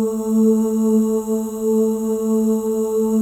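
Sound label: OH, female OHS, Voices; Spectrasonics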